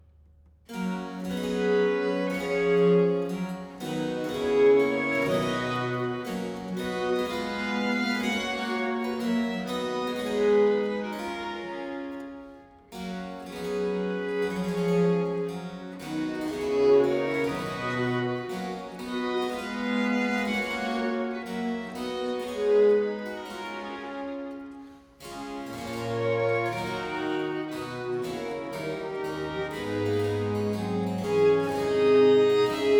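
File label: Suite in G major for two violins, viola & basso continuo - Air; Purcell; Complete Chamber Music; Brilliant Classics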